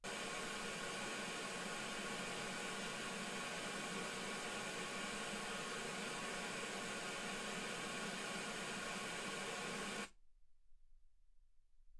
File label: wgn